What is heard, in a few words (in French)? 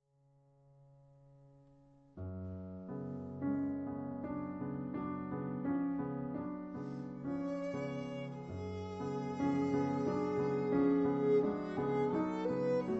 Sonate violon piano; Sonate, violon et piano; 3. Modéré, très à l'aise, au gré de l'interprète